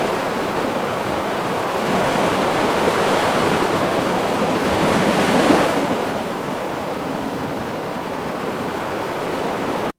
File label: ambience ocean